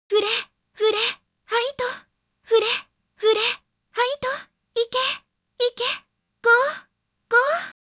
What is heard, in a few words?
cheer loop